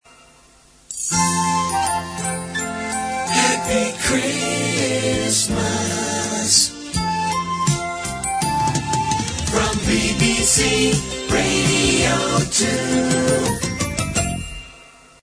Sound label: jingle